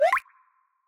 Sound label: Message sound; Tumblr